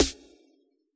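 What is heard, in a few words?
snare drum analog; AnlgKit1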